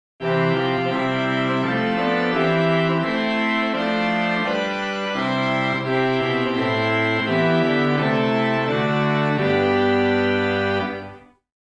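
Swell Rohr + Gems + Principal + Mixture + Trompete + Schalmei; First Baptist Riverside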